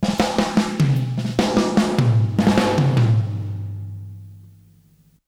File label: Fills 75; Zero-G; Monster Beats